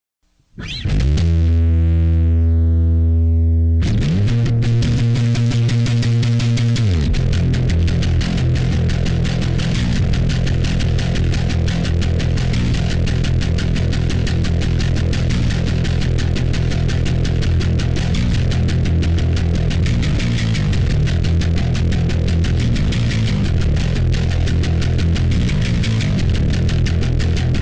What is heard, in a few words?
rhythm